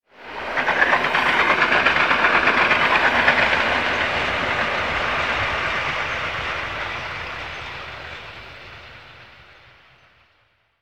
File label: Mid Distant Train Passing By